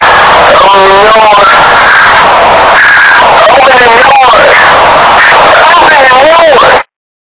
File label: quiet chill music